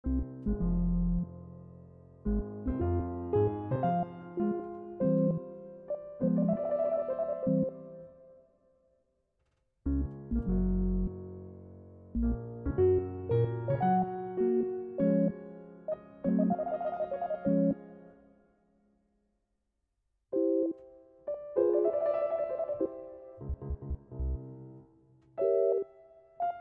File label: Sync Sine Soni